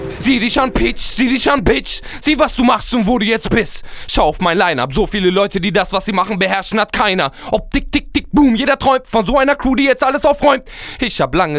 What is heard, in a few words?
USA Acapella